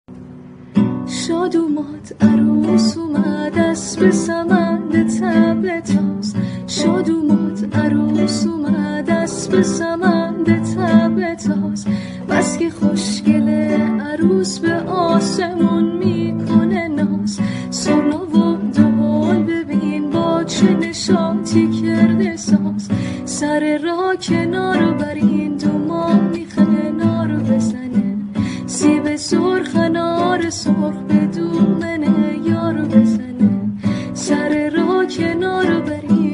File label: Dokhtar Guitar